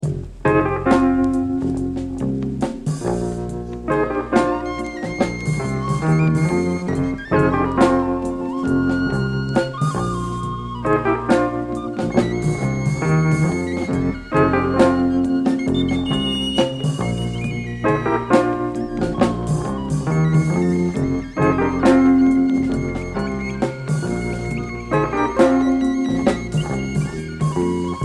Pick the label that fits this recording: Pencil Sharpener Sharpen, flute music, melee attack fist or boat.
flute music